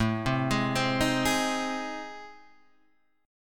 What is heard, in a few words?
A Minor Major 7th Flat 5th